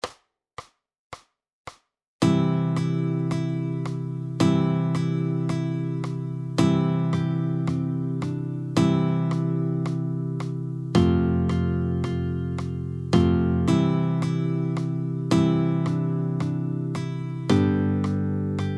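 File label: Jingle Bells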